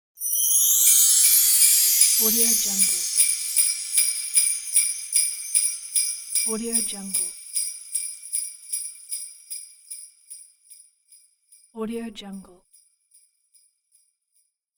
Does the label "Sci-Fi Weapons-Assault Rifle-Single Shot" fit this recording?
No